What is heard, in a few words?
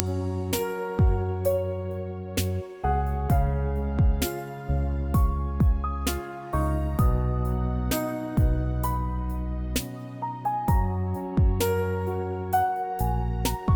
70 BPM ambient piano relax music; Google Lyria 2